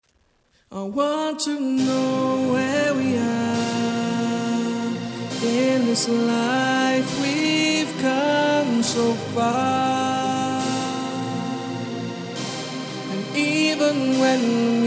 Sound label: Funky House Vibes